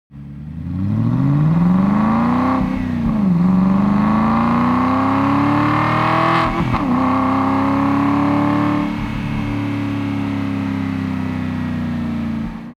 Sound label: CAR Aston Martin Acceleration Long